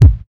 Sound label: bottom kick; Gangsta Sound Kit